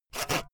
sawing short

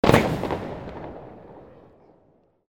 explosion

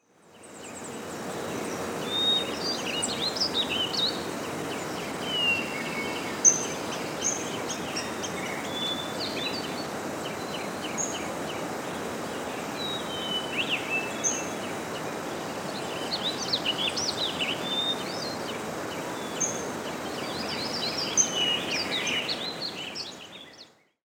parrots south america

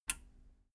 metal button radio release power off

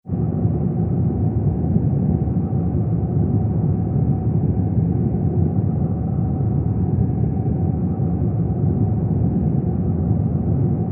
midrange hotnoise diffusion

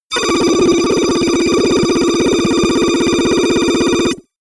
notification data transfer aggressive

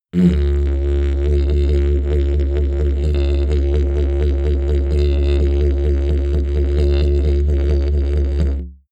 sequence didgeridoo wobble sequence

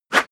sword whoosh

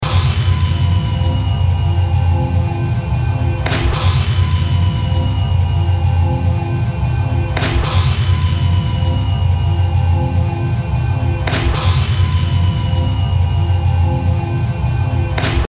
musical fx loop

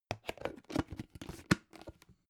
plastic bowl close

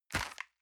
envelope grab hard

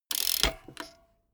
bmx bike freewheel brake

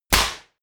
stylized fist punch

